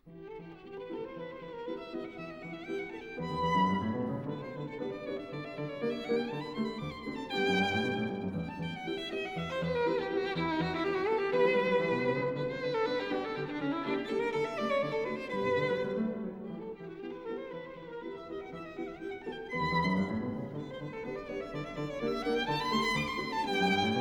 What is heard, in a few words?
Variations for flute & piano in E minor